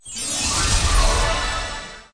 random Symbol Transform; fairyhansel

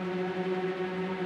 kettle tremolo; irish pot luck

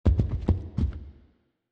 walking Wild Movement; godsoffortune

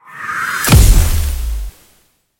win explosion; dazzle

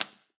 chip; roulette

